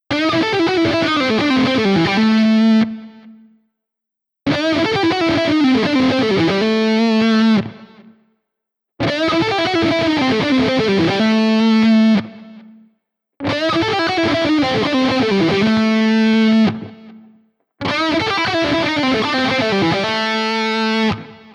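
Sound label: Lead SC1 Single coil, pickup; lead SC2 Single coil; panico pickup sc2 single coil high gain hot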